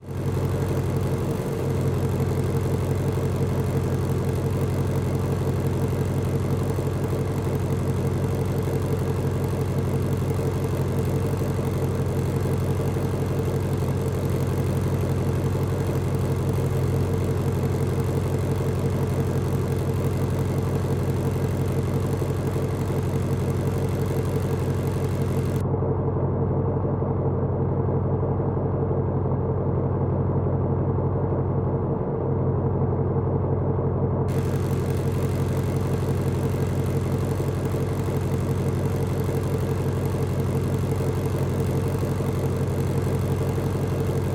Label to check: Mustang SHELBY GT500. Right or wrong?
Right